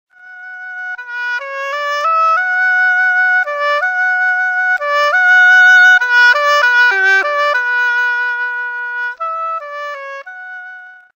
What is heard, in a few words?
OBOE SOUND; ساز ابوا